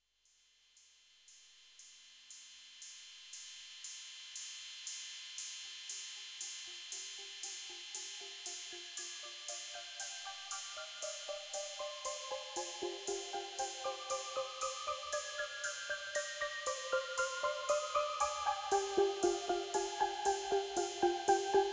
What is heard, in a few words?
science funk